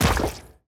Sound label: bounce large; fistula